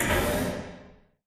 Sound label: radar tower servo; Titanfall 2